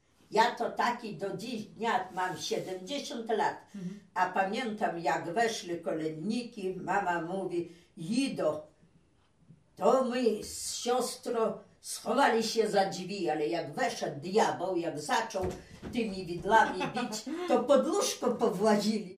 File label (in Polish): Wizyta kolędników; Węgorzewo